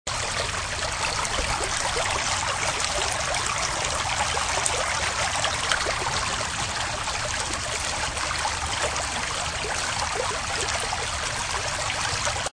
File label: park urban creek; ann morrison